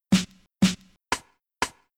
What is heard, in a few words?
Rhythm RnB SD